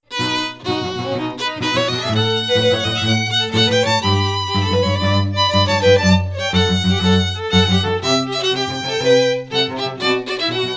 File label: trio